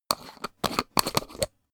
lid plastic unscrew; haus-garten; badezimmer

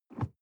sports car int pedal gas release; verkehr transport; autos

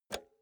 pull out xlr multi socket hard; objekte; elektronik

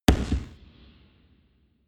gun pop gun pop; schusswaffen

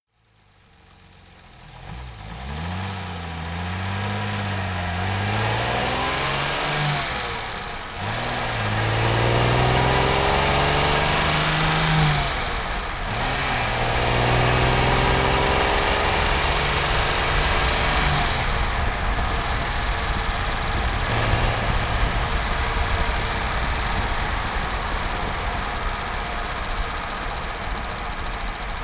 car jaguar e-type accelerate decelerate engine

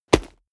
combat cloth punch soft; krieg-waffen; nahkampf; soundpool

